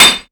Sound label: FX Impact; Vengeance Samples